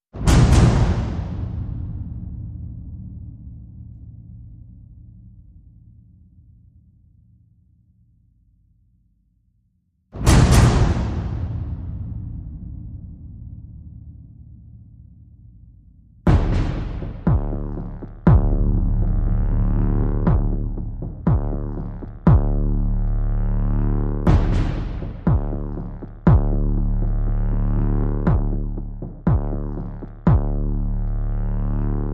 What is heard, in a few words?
Percussion Only